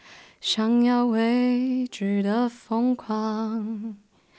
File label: Alto